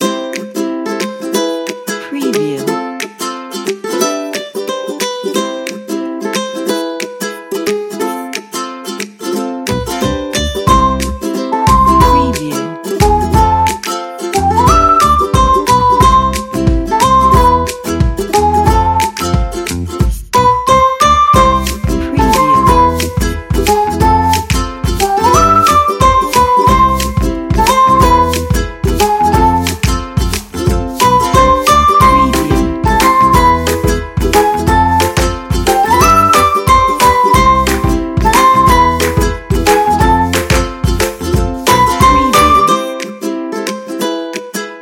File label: happy ukulele